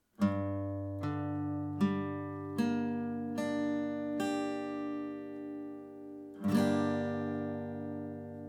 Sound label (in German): G Moll Barre E